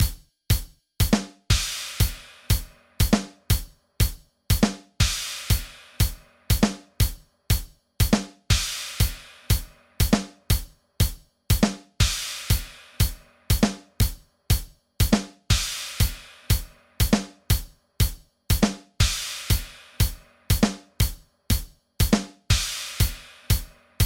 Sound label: Math Instrumental